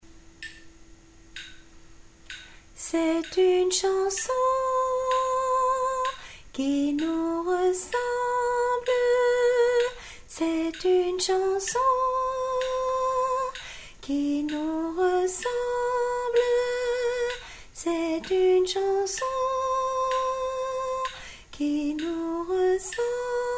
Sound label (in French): c'est une chanson hommes